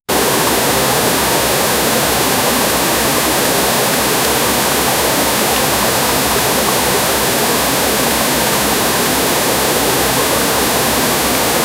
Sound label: water starting to rush